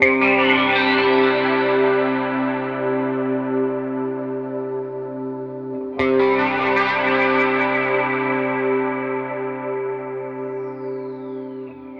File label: Guit Pad, ambient guit pad; сэмпл гитары